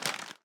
Footstep Fertilizer

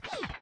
Armadillo Hit

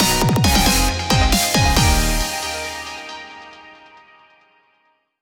Level Up; Pokémon Trading Card Game Live

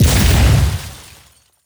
Building Part Smashed

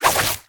Monkey; Rabbids Multiverse

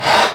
Rail short

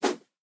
Dummy Attack

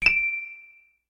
Jewelry Gain

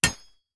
Pickaxe; Myla

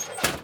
Ruins Big Lift Gate Door Thingy Close; gamerip

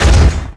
Crash

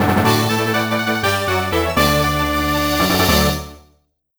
Received Battle Points!

